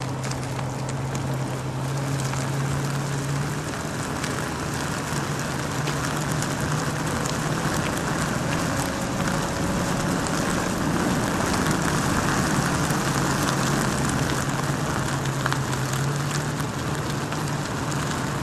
Car Tire Grit On Pavement Loop

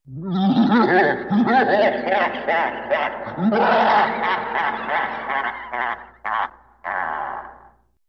Big Devil Laugh, vocals

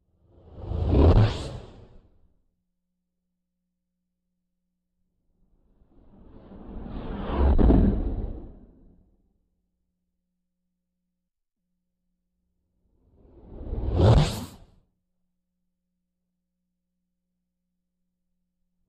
Spacey Whoosh Bys

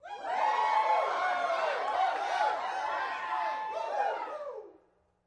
Crowd 20 Rowdy Short, small int

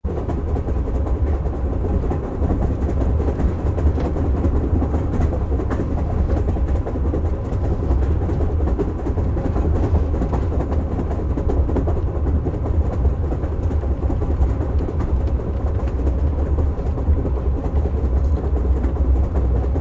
Steam Train Boxcar, steam train

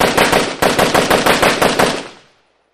Ak47 Gun Shot Multi

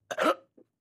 Belch Choke; belching

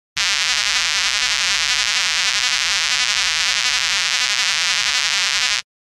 Buzz Fast Light Fast L

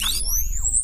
weapon charge, charges

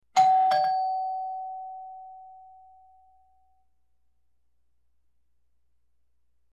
Good Ding Dong Door Be, doorbell

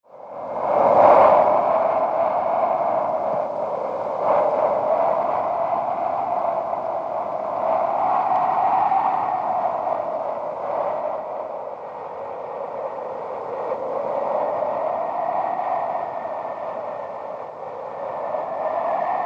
Eerie Brisk Wind, Howling Wind

